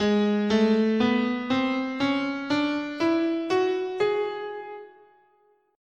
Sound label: Eight tone Spanish, scale, guitar; Gsharp